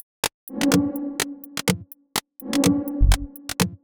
Top C# 125bpm, House Drumloops; 90 s Sample CDs; Zero-G House Fabrik Drumloops; ZG Drumloops

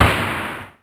Snare, Drum Machines; Ensoniq ASR-X Pro; 300 Drum Machines

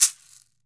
CAXY, CAXIXI; Brazil

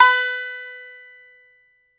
BAGLAMA, Plucked Strings

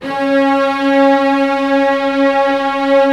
STR SEC, STRINGS; Roland - LA Composer vol. 1

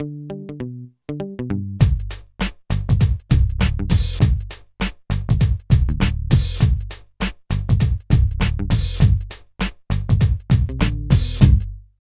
LOOP; East West - Phat & Phunky

